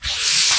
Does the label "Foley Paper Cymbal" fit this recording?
Yes